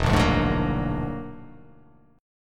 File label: E 9th Flat 5th E down